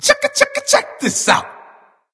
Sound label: ward placed; smite; ravana